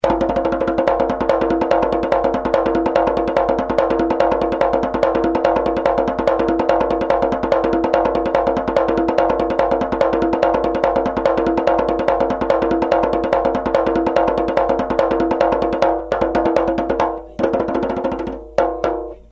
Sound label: djembe, ghana efutu